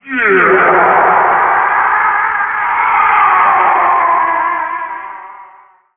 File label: Distress; screaming noise; agonizing distress; Jabun; Water Spirit; The Wind Waker; Zelda